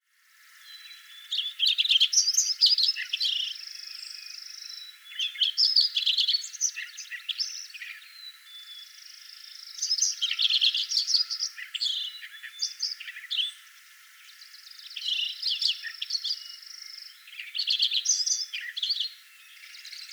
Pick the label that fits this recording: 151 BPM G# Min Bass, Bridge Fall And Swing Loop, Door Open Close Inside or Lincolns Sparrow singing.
Lincolns Sparrow singing